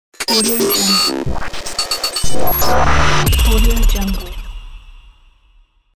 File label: hi tech impact